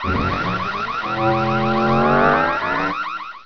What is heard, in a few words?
plasma; TNPDEMO